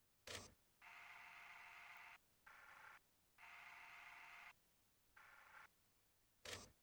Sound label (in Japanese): ヘッドホンボリューム10 フィルタ発生片チャンネル; inateck; TRONDO